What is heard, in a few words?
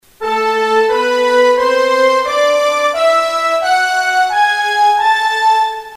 minor key